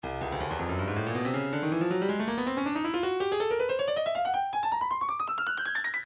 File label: breakbeat midi files 140 170 bpm 160 drum n beat; Stylus